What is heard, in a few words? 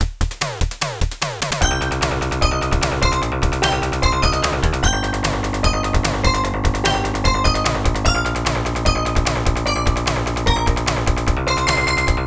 Chopper BGM; Action Fighter; System 16A; arcade